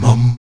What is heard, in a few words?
VOX MUM B 2A, Afro Chants, Stacc Mums; Roland LCDP11 Africa VOL-1